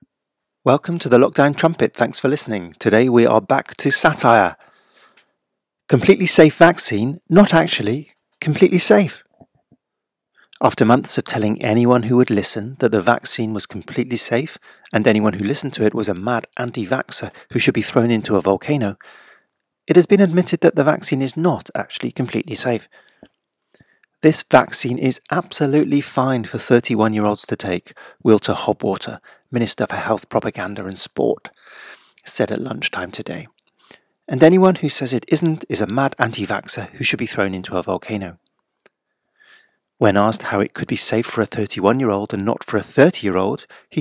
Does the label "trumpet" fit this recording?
Yes